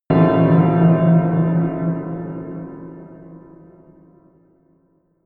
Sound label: melodic disharmonious bell low